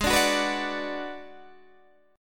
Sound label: Ab Minor 7th Flat 5th Ab, down, Guitar, DADGAD